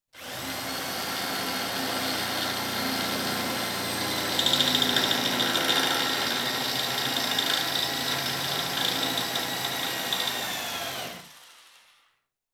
Electric Drill, Single Hole In Me; Construction; BBC Sound Effects Library

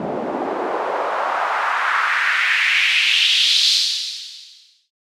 Very Short Sweep, White Noise

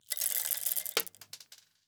Coffee, Beans, Add To Hopper, Drop To Floor; UberDuo; The Home Barista

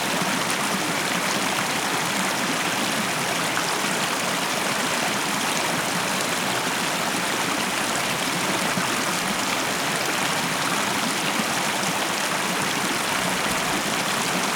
stream loop; Hydrology Streams, Rivers And Lakes

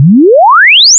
sweep, down, audiometric; Eiravaein Sound

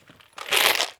foley; 99Sounds; Sound Design Tools; Raw Foley

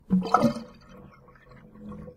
sink Drain; Foley; Kenney's Sound Pack

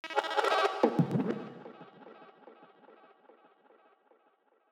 Tech, Weird Side Samples

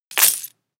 Chain Metal Drop On Floor; Foley; Mechanical Wave; Foley Session 01

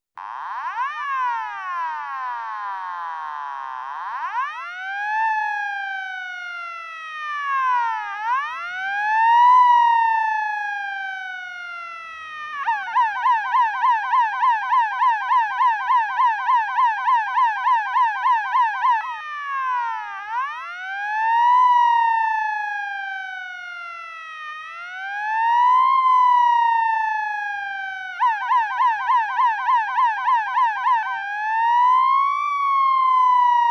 Wail Siren Changing To Yelp And B; Emergency; BBC Sound Effects Library

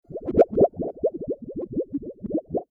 Under Water Sound; FXHome

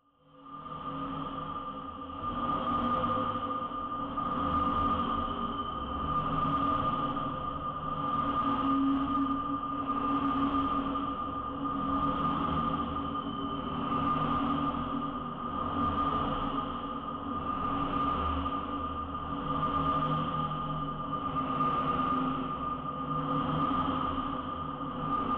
Magnetic Field; 99Sounds; Magnetic Fields

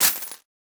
Stack Coin Bag, Money; The Sound Pack Tree

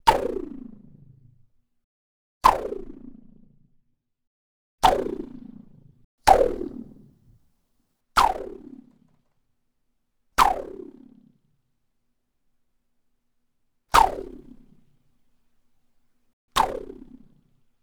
Whoosh Bungee Exercise Rope; Sartorial Sound; SWOOSH THERE IT IS

